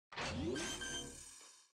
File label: spike plant; valorant